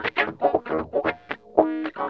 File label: WAH PING, Funk Gtr & Wah; PlatinumPhattCD2; E-MU Producer Series Vol. 8 – Platinum Phatt